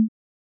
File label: grunt